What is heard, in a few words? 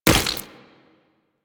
Land Flesh, Footsteps; Warframe; Tenno